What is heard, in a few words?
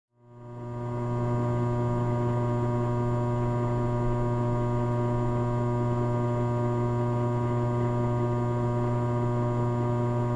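sounds of room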